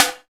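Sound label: DINK SNARE; Drums In Your FACE!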